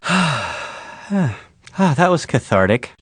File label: lose